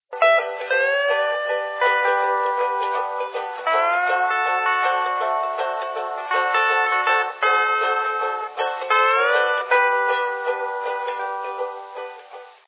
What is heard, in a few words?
music high